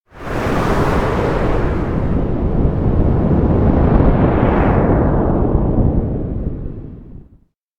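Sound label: electronic black wind roar sucked; anemoi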